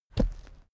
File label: step wood step